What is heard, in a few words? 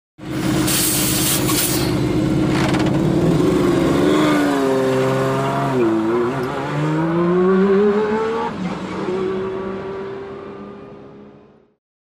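Sports Car In Pit Away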